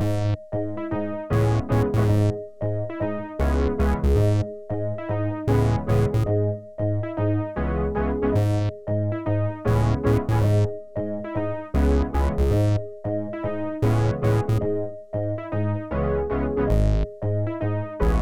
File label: All Synths Plus SH101